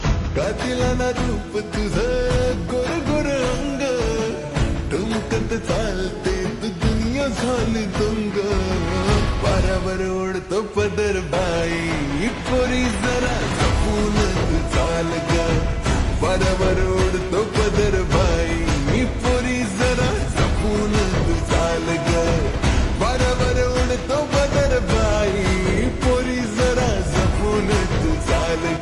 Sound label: Ringtone Slow Reverb